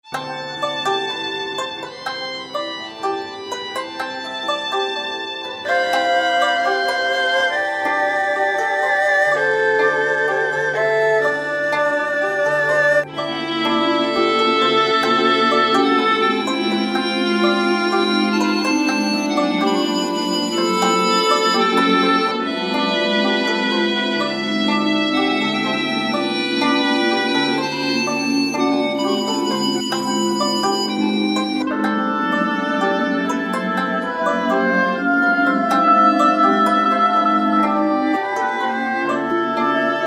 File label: eastern strings